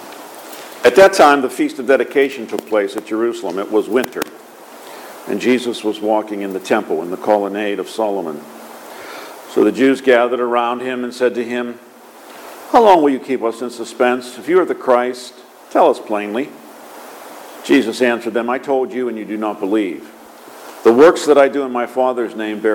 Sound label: Gospel